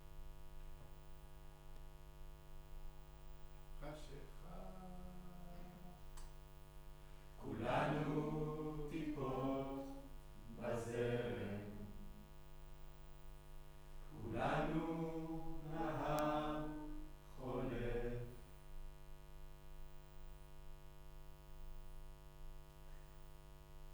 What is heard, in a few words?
Room, Choir